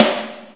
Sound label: snare